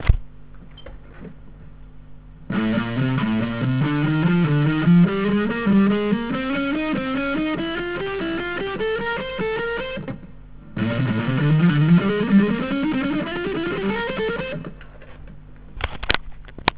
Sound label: alternate picking